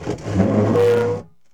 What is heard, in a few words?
BOX SLIDE, Box Noises; E-MU Producer Series Vol. 3; Hollywood Sound Effects